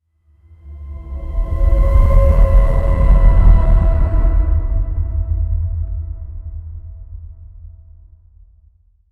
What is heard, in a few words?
cinematic cosmic sound, cosmic